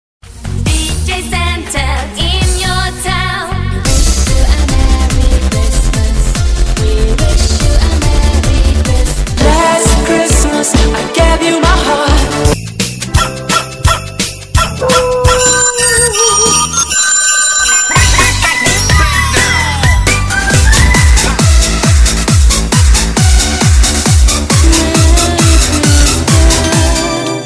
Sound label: funny xmas mix